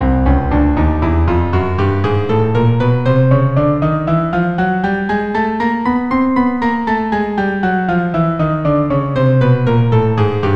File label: chromatic scale, keyboard